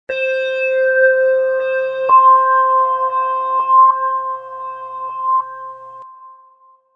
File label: High Evil Tone, high-pitched and creepy background sound; High quality stereo high-pitched and creepy background sound excellent for evoking fright and fear, perfect for horror-thriller genr; Scary Tone